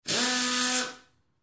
Air Drill Straining; air tool

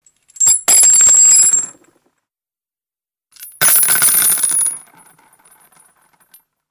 Shell Casings Hit, handgun mvmt

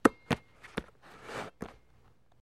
Football Handling

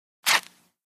Zipper Short Light

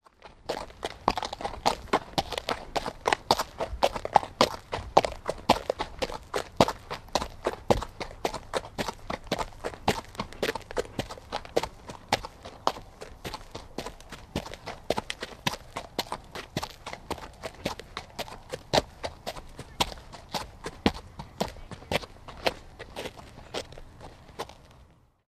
Horse Walking

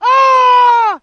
Death Screams 1; 10 Male Screams, Various

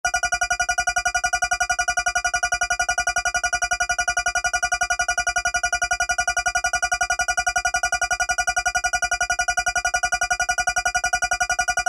Scanner Pulsing Or Al, drones

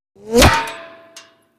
Bullet with Whir Impa, metal

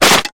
Bullet Hits Wood Crac

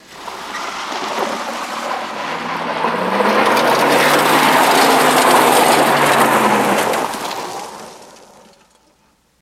Car Tires Through Dirt and Gravel

